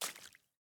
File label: water land